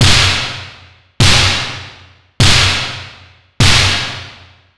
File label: snare